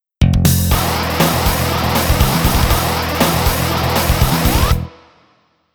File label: jingle